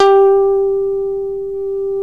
ALEMBIC BASS; OMI - Universe of Sounds

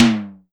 SIMMONS SD-5 drums

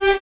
Violins G 4; Miroslav Vitous; String Ensembles; Detache

